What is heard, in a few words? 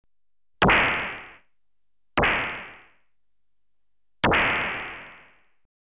Stun Gun (3 Single Shots), Electronically Generated Sounds; BBC Sound Effects Library